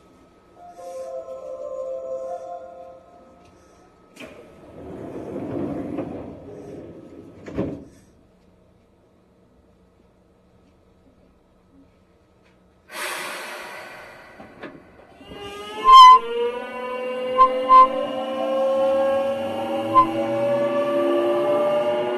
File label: vvvf, mitsubishi; seibu 2197